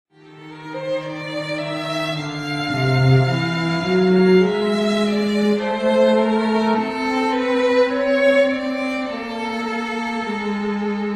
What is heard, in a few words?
Duet for violin cello; Cowell; Duet for Sidney with Love from Henry; Anniversary Piece No. 36